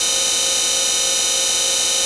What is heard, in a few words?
metal, SQ80